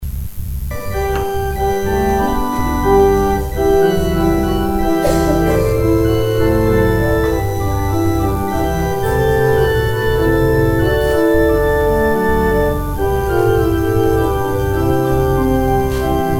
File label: organ interlude; Offering organ interlude